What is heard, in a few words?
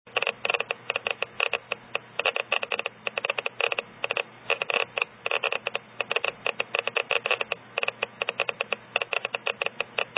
Thorite Kemp Uranium Mine Cardiff Township Ontario Canada Beta 1 inch 10 sec